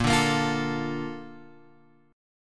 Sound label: A sharp Major 7th, Guitar Open G